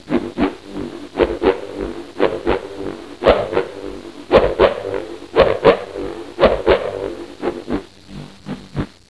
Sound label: windwand